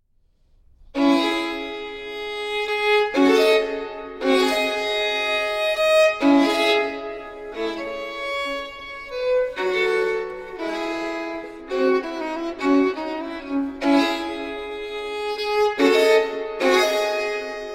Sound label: Ciaccona